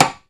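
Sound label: closed rim, timbales; E-MU Producer Series Vol. 2 – More Studio Essentials; Composer; Timbales Xswitch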